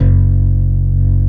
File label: BS F#, Hip-Hop & R&B; USB Soundscan vol.47; AKAI